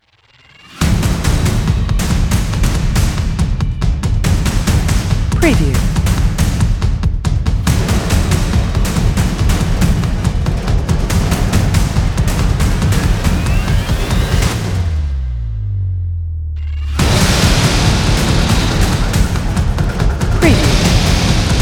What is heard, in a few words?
Massive Action Drums Cinematic Powerful Rhythmic Hits Fast Tempo Trailer Intro